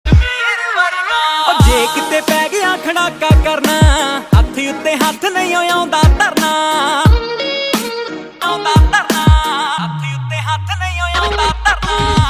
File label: Punjabi Beats Ringtone